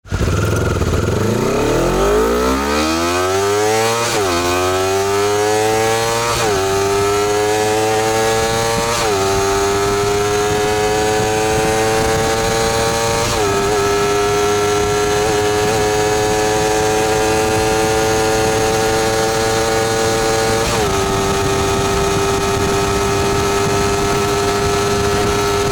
cbr250rr lci acceleration

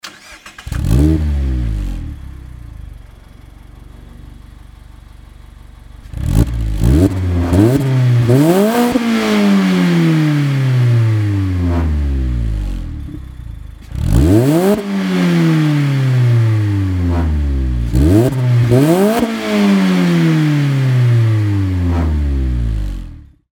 nd roadster; nd5rc; fujitsubo

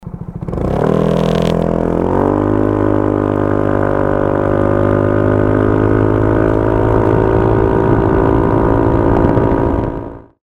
cygnus x tawashi acceleration; daytona

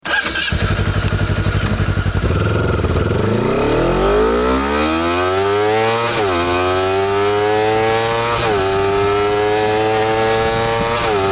cbr250rr acceleration; zx250e

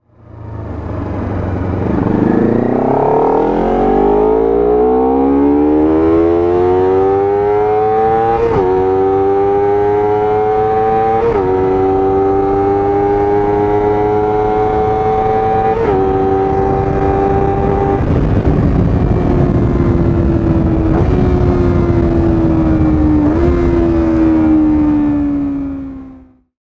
cbr250rr acceleration

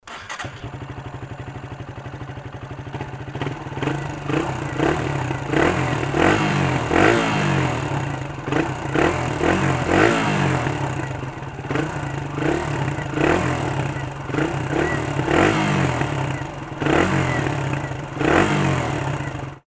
klx125 barrel

